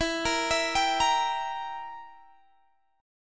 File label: E Diminished E down Guitar Standard; Edim arpeggiated; JGuitar